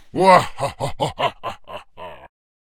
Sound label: Samurai Giant Attack Vocals